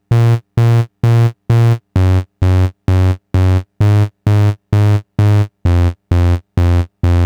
NRG Bass, Trance

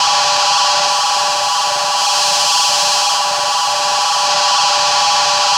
crankshaft, amb. noise; Spectrasonic Distorted Reality 1

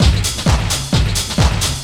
techno, DRUMLOOPS; LOOP-TECHNO4; Techno Trance; Ueberschall; Techno Trance Essentials

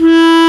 E2 HARMONICA

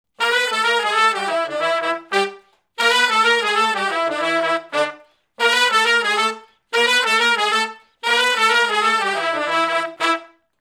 R&B Riff (E) unison, Horncraft; Sonic Foundry (Sony Creative Software); Crimson Blue and Fabulous Horncraft 4 RnB; Horncraft for R&B; Sections

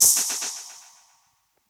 Perc Hit, dub percussion

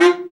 BRA BARI, Bariton Sax

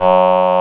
vocoders; Sound & Vision - Gigapack I CD 2

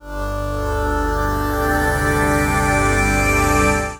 Horizon String; Strings & Pads; Sonic Foundry (Sony Creative Software); The Electronic Music Manuscript Collection

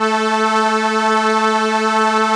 ARP A2, STRENS; Sonic Reality; Vintage Timetraveler

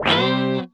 GUI MULT; EastWest; Dance Mega Hip Hop Electro

